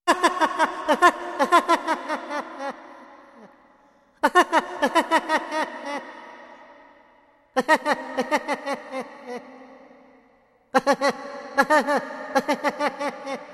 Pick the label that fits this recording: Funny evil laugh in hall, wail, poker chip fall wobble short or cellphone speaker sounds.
Funny evil laugh in hall